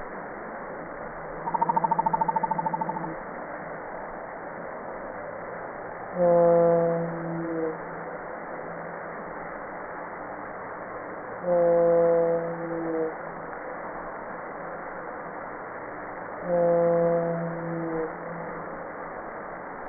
whale blue pacific trill clic moan; cornell

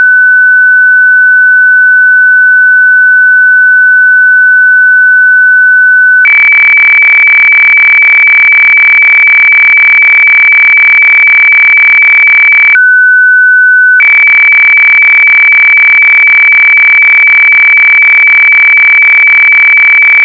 mode hffax240

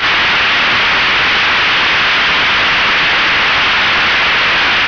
hydrogen 1420; argus

